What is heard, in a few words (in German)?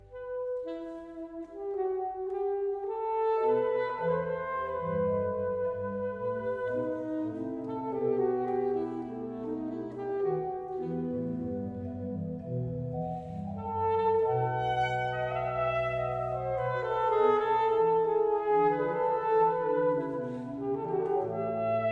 Sax Orgel; saxophon; Friedenskirche KS; J.S. Bach BWV 1035; 3. Siciliano; Friedenskirche KS 08.09.2023